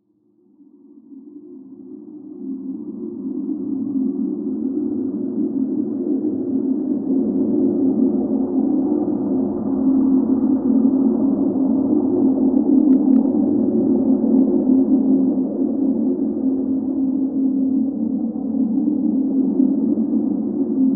sound design wind supernatural alien; fork media

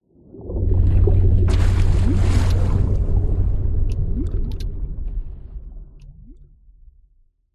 water whoosh impacts movements; george gazetis

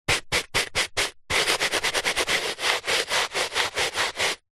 brush small scrub carpet; household

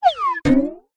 cartoon fall bounce

